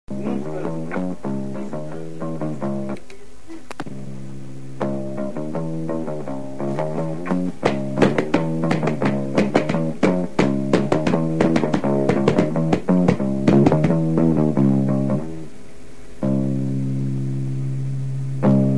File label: instrumental; thrash